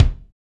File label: BD, KIT Real; 90 s Sample CDs; Sound & Vision - Gigapack I CD 1 (Roland); Real-Kit s 6